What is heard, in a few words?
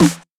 Heavy Snare